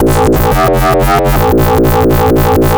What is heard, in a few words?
Popwow Bass, Drum n Bass; KJ Sawka; Drum n Bass & Beyond